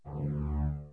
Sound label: STRING D2; 16bitsamps